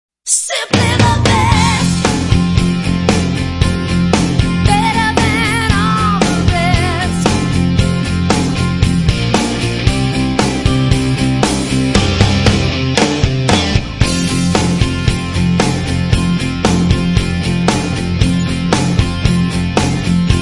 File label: jingles